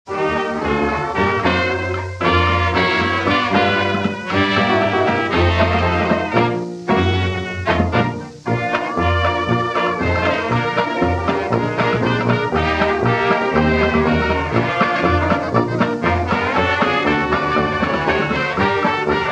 Ding Dong Blues

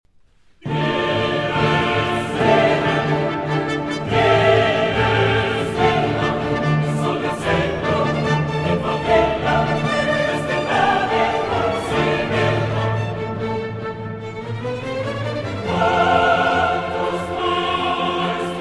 Requiem in D Minor, Sequentia Dies irae; Mozart Requiem in D Minor, K. 626 - 3. Sequentia Dies irae (Live)

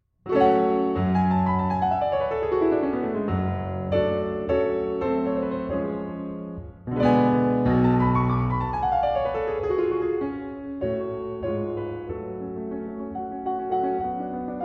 Piano Sonata in F-Sharp Minor, Allegro Spiritoso

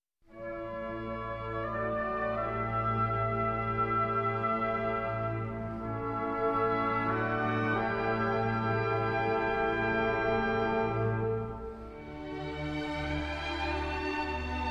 Symphony No.9 In D Minor, Choral; Symphony No.9 In D Minor, Op.125 - Choral - Europa Hymn; Beethoven

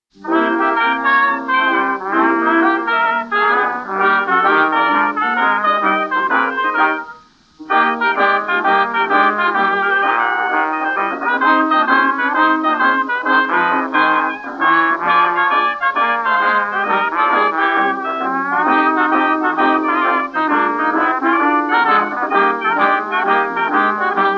Steady Roll Blues

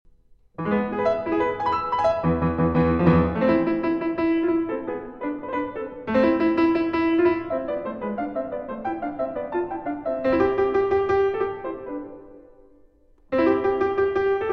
Fantasia in C Major, Presto di molto

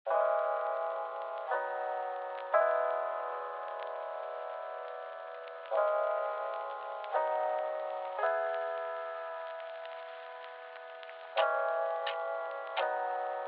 Clock's Ticking

